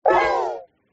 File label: bomb detonate flowers; gardenbloom